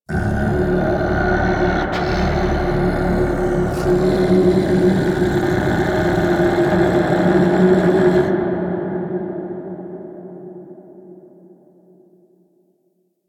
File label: Misc Mitw Shouts; Ambience; Man In The Wall; Entrati